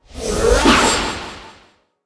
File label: warrior attack; monster